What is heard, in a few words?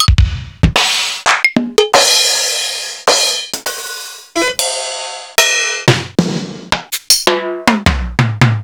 Kawai R-50